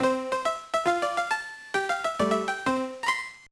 Color Drum; Microgame

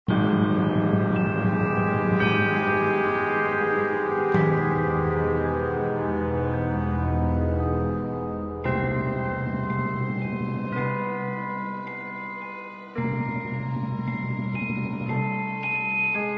Mystery Ambiance; Alice: Madness Returns unreleased original soundtrack

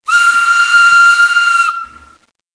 Steam Whistle; Learn to Fly 3

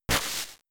Sand Attack

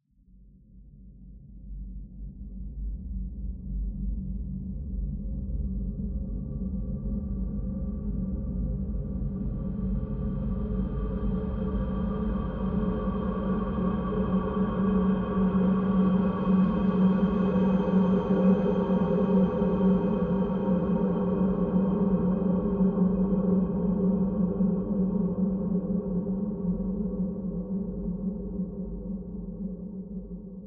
Ambiance Dark Pad

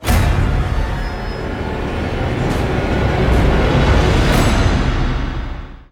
End Riser

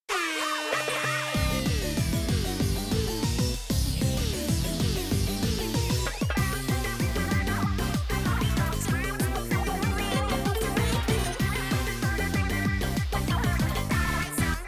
BGM Versus Fes Thunder Last 1 Min

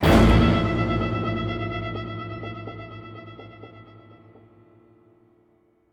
Stinger

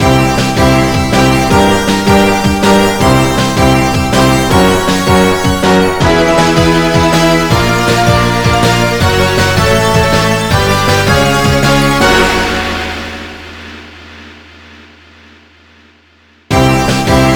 Beat The Final Boss Longer Fanfare; American Dragon Jake Long Attack of the Dark Dragon

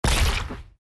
Ink Explode; Fancy Pants World 1 Remix

